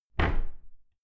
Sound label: cupboard close heavy